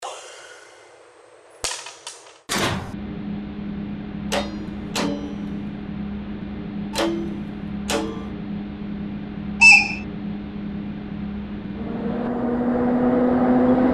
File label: Eloc VL10